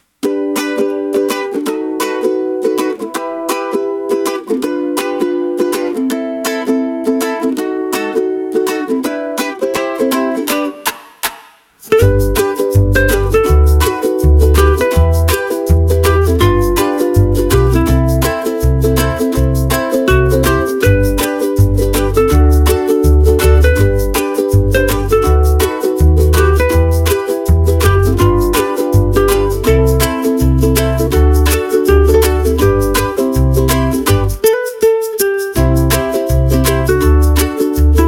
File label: happy music background